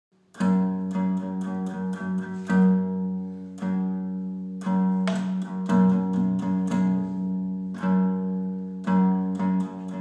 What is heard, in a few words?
Guitar Version; We Real Cool